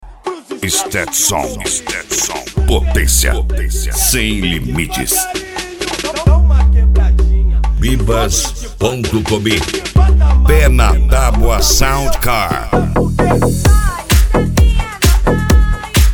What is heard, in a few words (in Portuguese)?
sound car carnaval